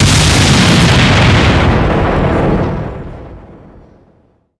explosion huge

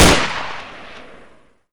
shoot, weapons

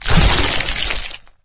carrier walk; flood

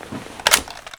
clip out; weapons

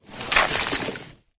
human form long move; flood

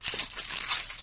move, flood infection

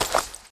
rotate, weapons

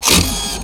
rockets ammo; serioussam2

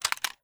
uzi mag in partial

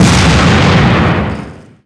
explosion

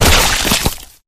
flesh destroyed medium; flood

